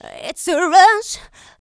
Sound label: Female Vocals; Houseworx